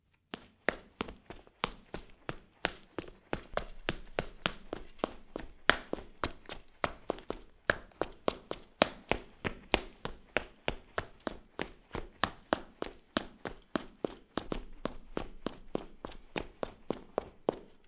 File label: Concrete Shoes Running; footsteps